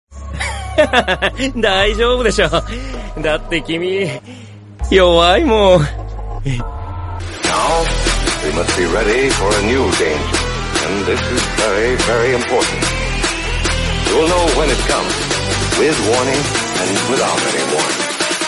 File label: bgm theme; jujutsu kaisen; gojo satoru hollow